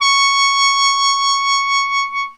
sheng c#5; WORLD INSTR 2; WOODWIND AND BRASS

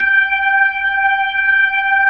B-3; Jazz; Roland LCDP10 Keys of the 60s and 70s 2

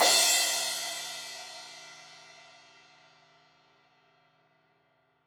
Crash 16in edge, Cymbals; Deeply Sampled Drums

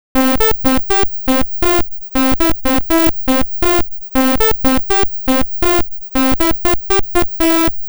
Apple II Synth, Chiptune

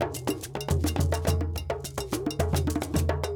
Djoun, World Percussion; Djembe, Donno, Bell, Shaker

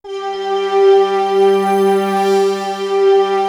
PADS; East West - Global Sounds

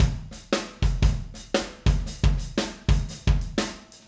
Acoustic Live Drum

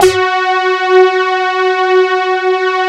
DS8 F#3, Korg; Back In Time Records; Korg Universe VOL-1

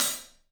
TPAH14, 3D Drums, LITE TIPAI H; 3D Sound

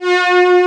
VCO SUS PAD; AKAI S6000 CD-ROM - Volume 1; SAMPLE WAVE MIXING 1

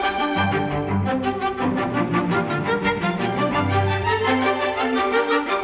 Strings; Sonic Foundry (Sony Creative Software); Orchestral VOL-2; Modern 09

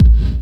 hard bass drumz

